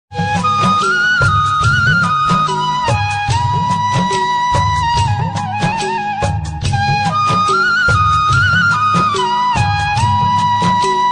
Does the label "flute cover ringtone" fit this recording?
Yes